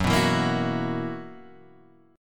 B Major 7th Suspended 4th F sharp

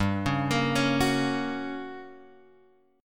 G Minor Major 7th Flat 5th G, Guitar Open G; chordshape; down

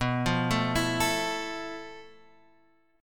F Major 7th Flat 5th B, guitar chord

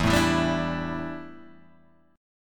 D Suspended 2nd Suspended 4th E

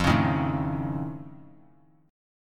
F Augmented 7th F, chord; strummed; F+7

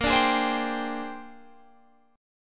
Bb 13th, Guitar, Standard; Bb13 strummed

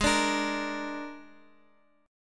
Ab Suspended 2nd Flat 5th Ab Guitar; strummed; JGuitar

